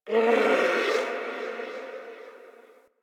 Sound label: Landscape Distant Creatures, Ambience; One Offs; Warframe; Venus Open World